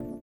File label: VIBRA; Spectrasonics; Metamorphosis